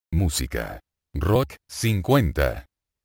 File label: rock